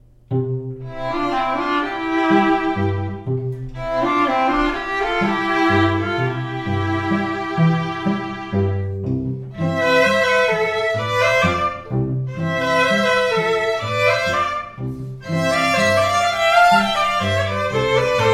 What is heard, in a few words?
Flower duet trio